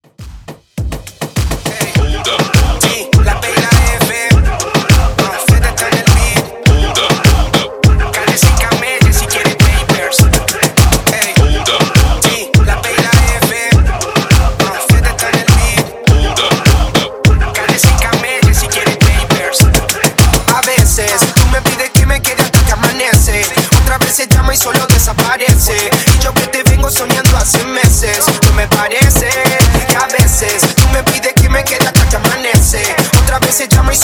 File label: Top40s Moombahton 102 BPM; remixplanet